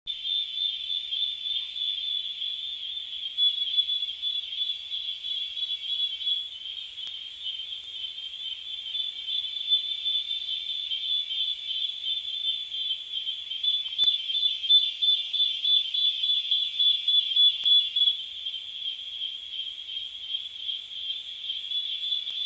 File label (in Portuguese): CIGARRA